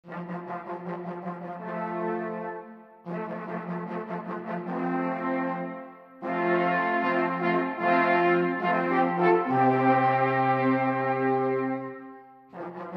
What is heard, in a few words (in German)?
musik